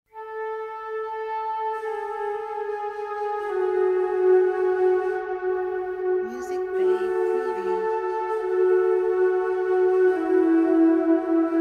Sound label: Mystical Forest Flute